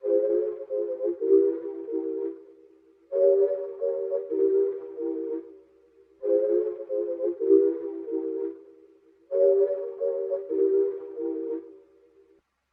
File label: 155 BPM F Min Pad; Loop Stems; Cymatics; 2022 Melody Collection Platinum Expansion; Warped